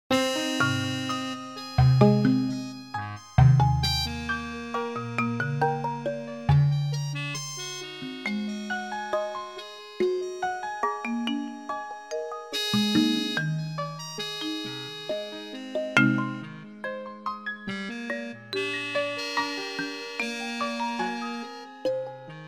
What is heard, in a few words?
fairground tune